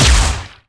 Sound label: impact; baddys; matriach